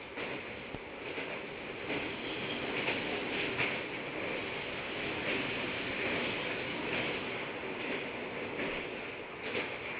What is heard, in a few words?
subway; noise